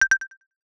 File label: marimba tone; UI; 3maze; UI FX